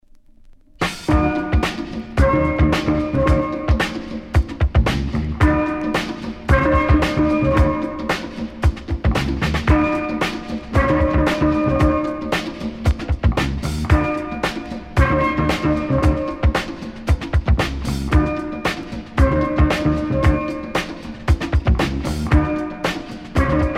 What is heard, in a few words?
Steel Band Calypso Rock